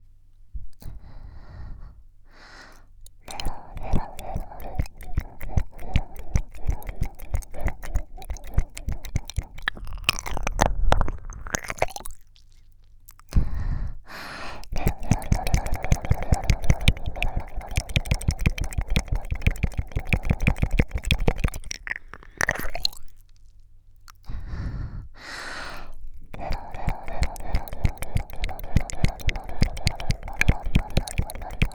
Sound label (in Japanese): 超れろれろコース